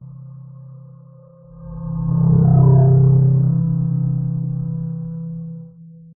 Whoosh Fly By Pass By Sci Fi Abstract Movement Flutter Glowing Warm Medium; Speed; Skyes Audio